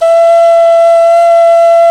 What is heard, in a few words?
Clay Flute; Roland LCDP11 Africa VOL-1; Afro Pipes